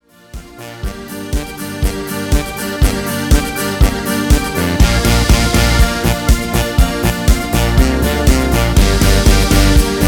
Hörprobe B DUR ohne CHOR; Pocahontas Medly

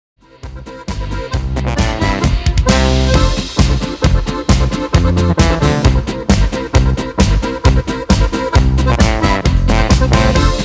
Hörprobe G C F B

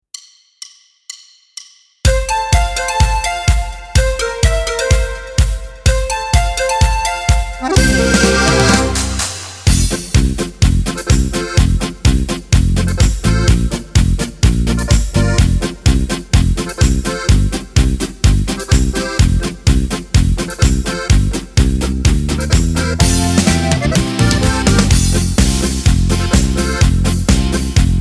GCFB Karaoke ohne Chor

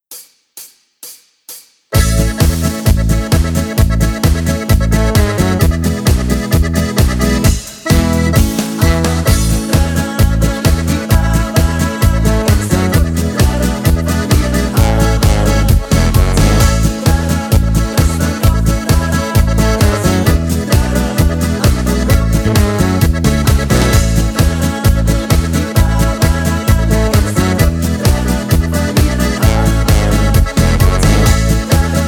ohne Harmonika Lead mit Chor

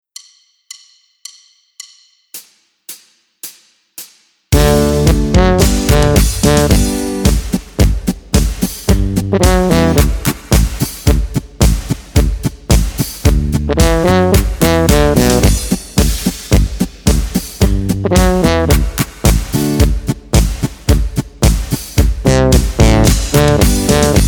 A D G C ohne Begleitung

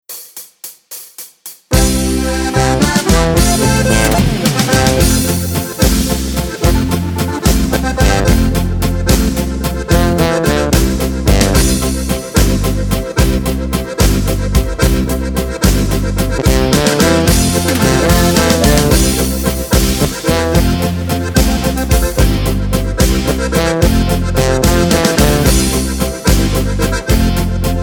G C F B ohne Chor

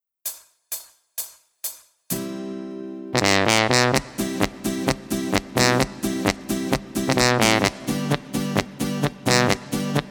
C Dur ohne Harmonika mit Chor

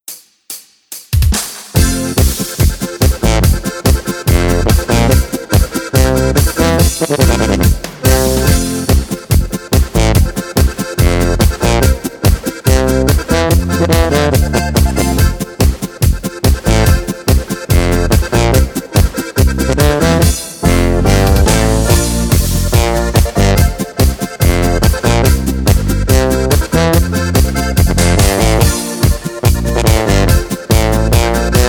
G C F B ohne Chor ohne Jodler

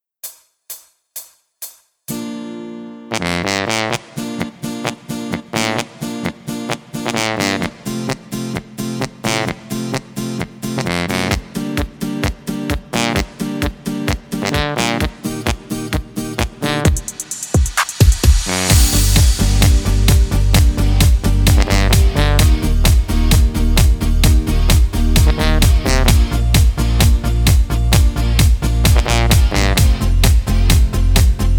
Landjugend B Dur ohne Harmonika mit Chor

